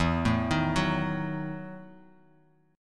E Major 7th Suspended 2nd Suspended 4th, Bass Standard; EM7sus2sus4 arpeggiated